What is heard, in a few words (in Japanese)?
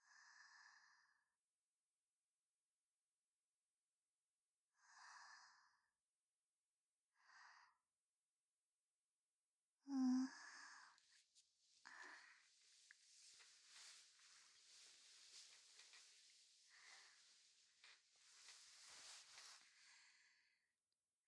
イヴの寝息 左 ループ